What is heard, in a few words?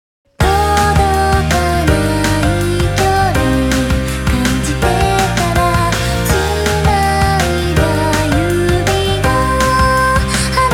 Rainy Heart BPM 163